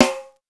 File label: Fairlight CMI III Snare, Drum Machines